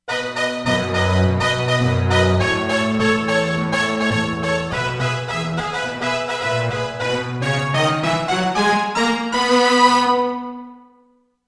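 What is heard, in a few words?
ethno brass, Korg; Korg MSC-13S Ethnic 2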